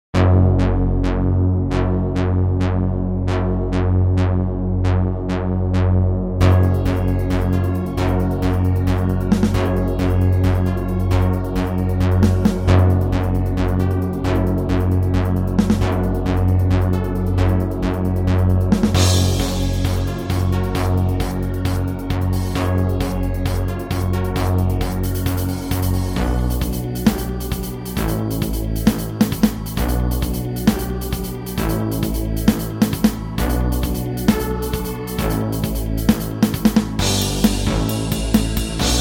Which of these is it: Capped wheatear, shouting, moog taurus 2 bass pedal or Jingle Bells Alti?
moog taurus 2 bass pedal